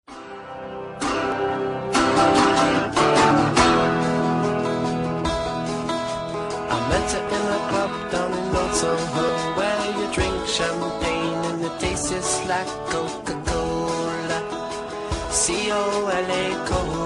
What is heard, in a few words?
iphone 3g s rock ringtone